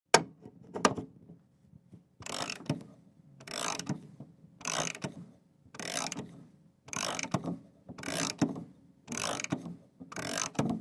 CLOCKS MANTLE CLOCK: INT: Winding a 1880`s large mantle clock, close up